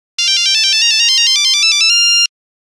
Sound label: Nokia 1100 Going up, Nokia Buzzer; Windows Phone apps